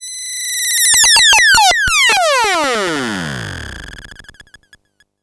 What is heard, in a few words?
dwindle; One Shot FX; Sample Magic; Transmission-X